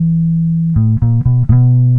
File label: Electric Bass; Basses